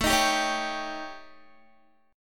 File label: A 7th Flat 5th A, Guitar; A7b5 strummed; 4E,4A,5D,5G,5C,6F; 17,18,17,18,19,x